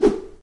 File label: woosh